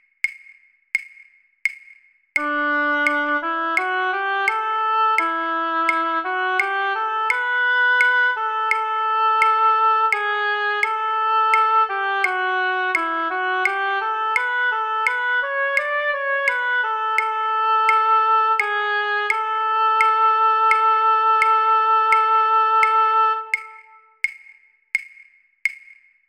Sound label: Sanctus, claves, Soprano; Certon; Missa Christus Resurgens; Polyphonie